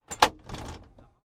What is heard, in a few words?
Helicopter Door Open, Light metal door in helicopter cockpit; Aircraft sounds; Light metal door in helicopter cockpit being opened.; Helicopter Sound Effects